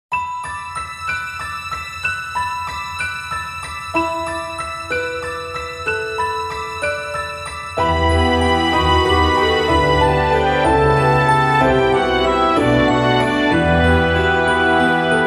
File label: snow